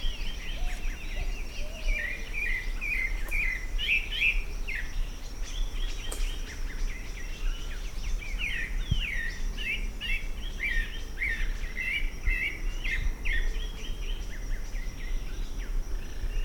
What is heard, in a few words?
Turdus leucomelas